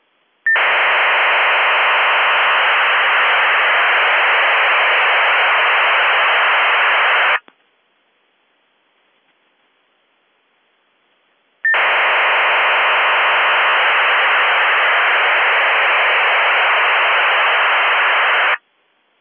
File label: RFSM 2400Bd DATA MASKING; RFSM 2400 Bd modified MIL-STD 188-110B 8-PSK serial tone waveform with data masking